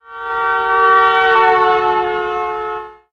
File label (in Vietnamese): Am thanh coi xe oto